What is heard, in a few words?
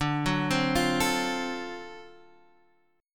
D 7th Sharp 9th, chord